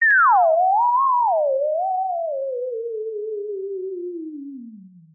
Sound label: synth theremin robot power down